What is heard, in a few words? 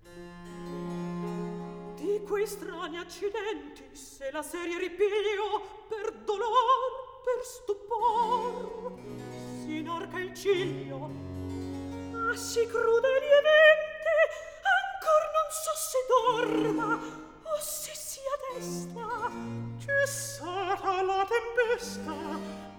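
Recitativo, Handel opera; Bernarda Fink; Act 3; Di quel strani accidenti; Handel - Rinaldo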